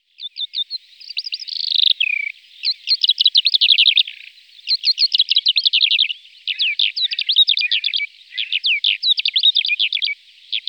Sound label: calandra lark